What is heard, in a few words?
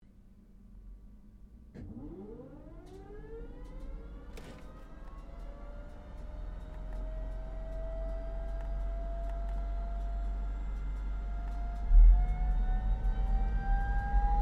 Aircraft 1947 Gloster Meteor F Mk8 Startup Interior, Gloster Meteor fighter jet; Airplanes